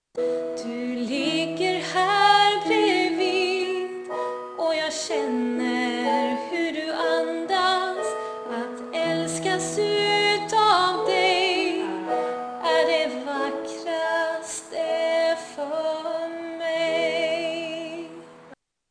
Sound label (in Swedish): Sång nummer